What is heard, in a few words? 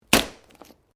Branches Dead Snap